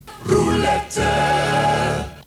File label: silence